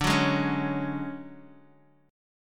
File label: D Major 7th Flat 5th D down 4G 5C 5E 5A